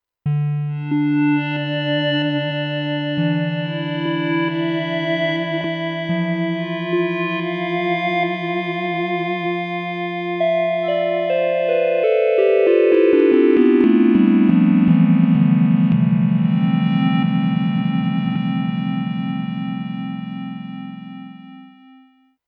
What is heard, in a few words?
PAD; sonicware liven xfm